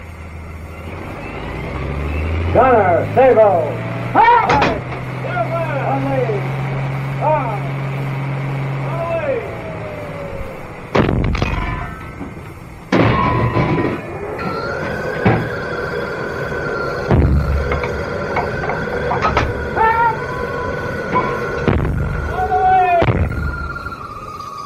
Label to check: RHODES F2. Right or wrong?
Wrong